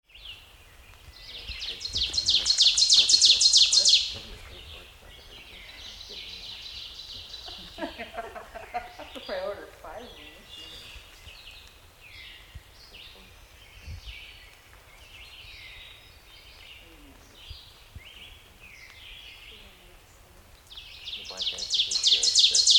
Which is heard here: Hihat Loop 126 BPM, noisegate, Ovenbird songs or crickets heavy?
Ovenbird songs